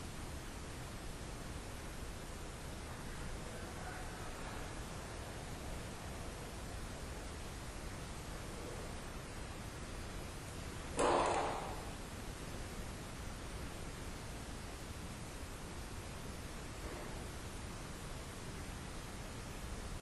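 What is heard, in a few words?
Unexplained noise; Case 023 Major Graham Mansion; Childrens Room; Olympus Plug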